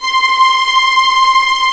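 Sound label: C6 tremolo, strings; East West; The Ultimate String Collection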